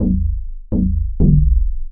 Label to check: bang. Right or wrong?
Wrong